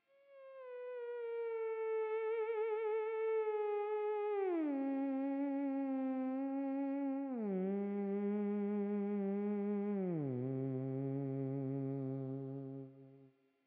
Theremin; gamerip; Hylics

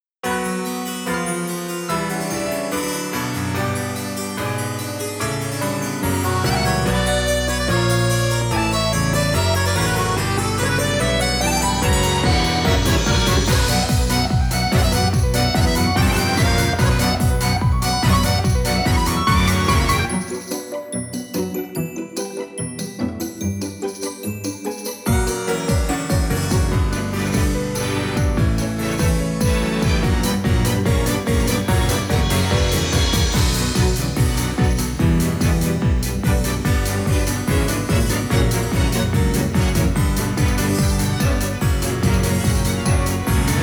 Goldener Traum (Instrumental); Pocket Mirror GoldenerTraum

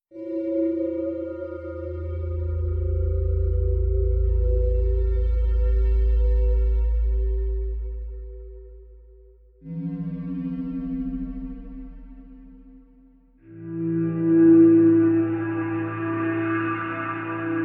Ambiant

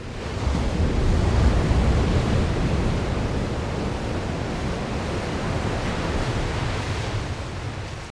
Ambient Sea Loop

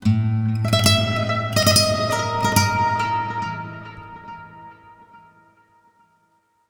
Oneshot Acoustic Guitar; zombie army 4 dead war; ps4 stadia switch windows xbox one

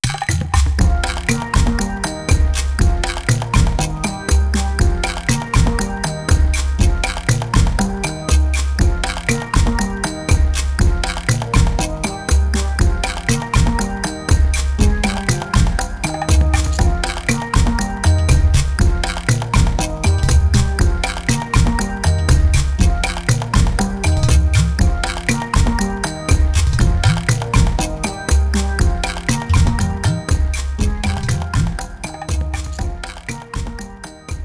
Jungle Drums; Klonoa 2 Lunatea's Veil

